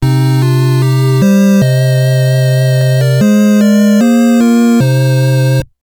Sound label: Congratulations; Days of Thunder; NES